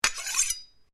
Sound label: Blade Swish Low, Sword